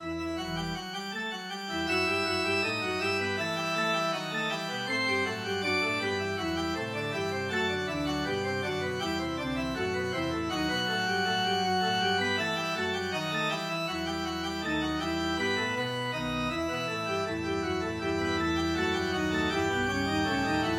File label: organ